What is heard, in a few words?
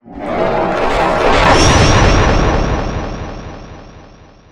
kill counter; weapons; dota; lion